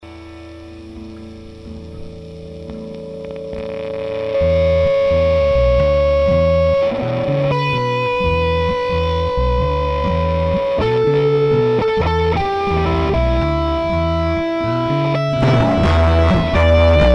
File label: p416b Short, fuzz; Fuzz Tone List